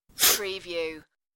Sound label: sniff